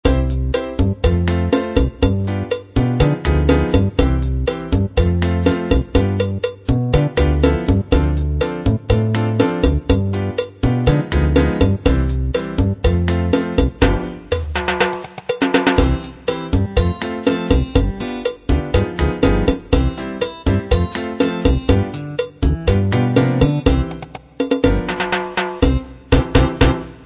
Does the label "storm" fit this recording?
No